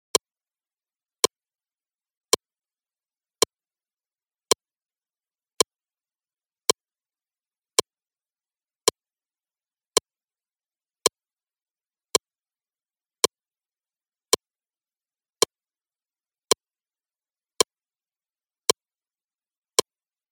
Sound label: Basic Blues Shuffle; 백킹트랙 ON